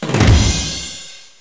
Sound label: Sound break